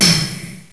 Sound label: crash